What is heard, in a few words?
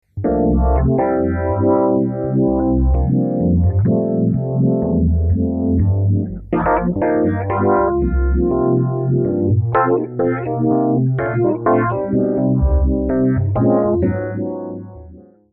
Univibe, fenderrhodes